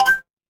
ui chime

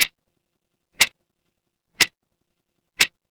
mechanical clock tick

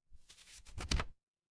paper fabric page turn

